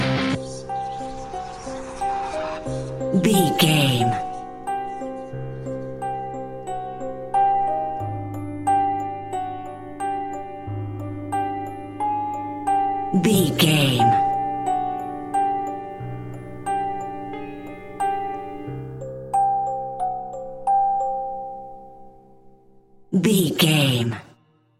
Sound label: modern horror